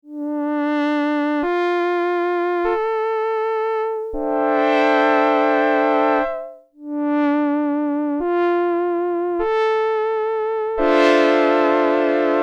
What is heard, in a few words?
korg delta vibrato orig modded